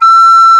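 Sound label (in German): BLOCKFLÖTE, Alpine Volksmusik; FLOETE; Best Service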